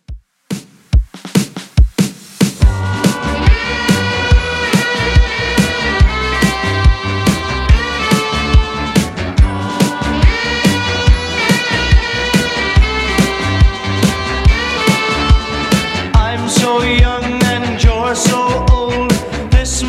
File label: 50s Redrum 142bpm